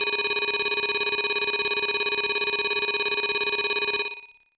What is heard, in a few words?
Alarm Call DX100 E5